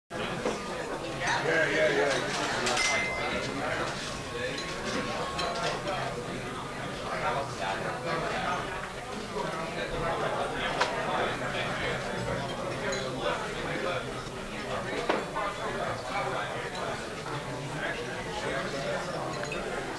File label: cash; ambient; shopping